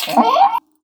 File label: jump